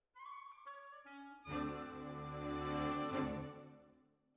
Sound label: Cellos Over